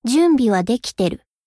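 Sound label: attack; hina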